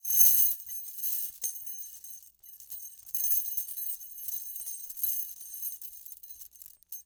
Chain Jingle Rattle; Foley

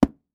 Household Book Hard Cover Closing

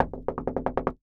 Wood Door Knock Fast, Household

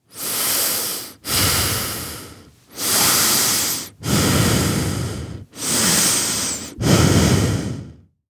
Human Male Heavy Panting

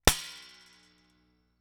Foley Toy Gun Hitting Cement Floor

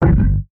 Buttons Mechanical; Multimedia Internet CD-Rom Flash

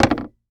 Impact PVC Pipe Drop On Wood Floor

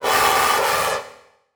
Transition Metallic Distortion; Production Element

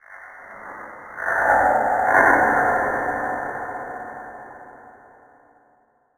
Production Element Title Transition Demonic

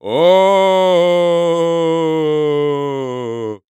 Cartoon Ghost Moan Argh Ghost Moan; AdobeCC

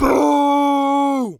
Human Male Yells And Cheers Boo

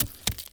Weapon Knife Fall On Dirt; AdobeCC

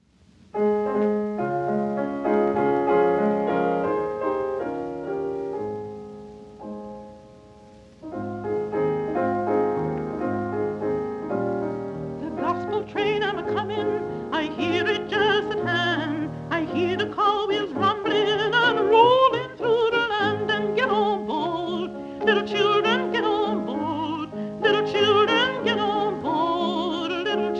Spiritual; De gospel train; Spirituals

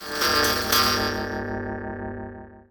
Title Transition Bell; Production Element Title Transition

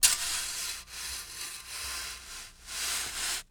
Industry Tool Shovel Sand Movements Scrapes

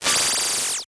Transition Laser Warble; Adobe; Production Element

